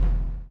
CHAIN; Spectrasonics - Backbeat

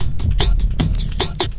LOOP, Drum & Bass, 150 BPM

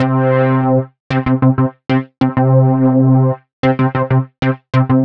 Modu Knocka 95, horrorscape samples 95bpm

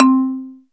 marimba; SN-R8-07 Mallet

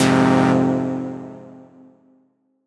Fm, Chords; Shnagah; M8; Electronisounds

